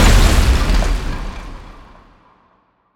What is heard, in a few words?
explosion